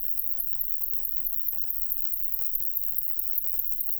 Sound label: song propsti, katydids neduba